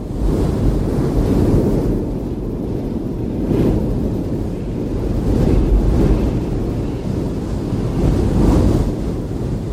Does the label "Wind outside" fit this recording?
Yes